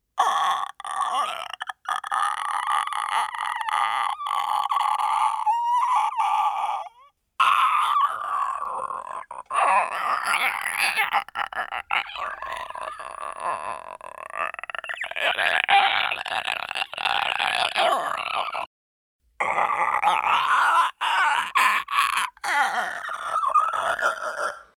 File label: Clickeresque Noices